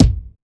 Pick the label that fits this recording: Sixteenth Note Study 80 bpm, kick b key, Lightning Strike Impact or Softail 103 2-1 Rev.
kick b key